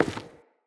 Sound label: Grunt Step Wood, footsteps; Enemy; Characters; Corrupted Sproutfolk